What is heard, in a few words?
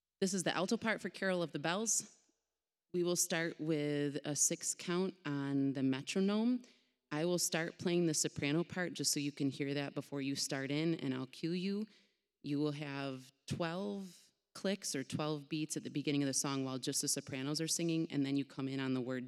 alto carol of the bells; christmas eve